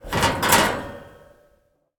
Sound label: random kitchen; devour